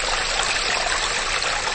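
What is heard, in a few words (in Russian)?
течение короткий звонкий на улице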